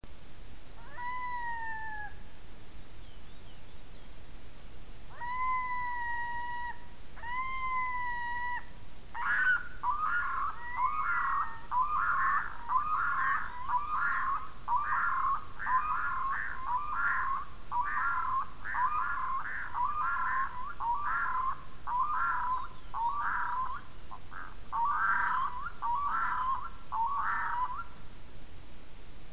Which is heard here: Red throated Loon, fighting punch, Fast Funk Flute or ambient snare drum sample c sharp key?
Red throated Loon